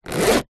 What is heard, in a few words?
foley small pouch bag rigid fabric zipper; zapsplat